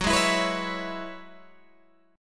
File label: F sharp 7th Suspended 2nd Suspended 4th F sharp down Guitar Open G